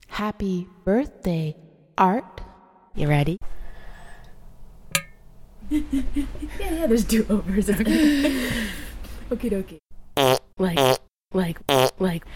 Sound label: all girl fart band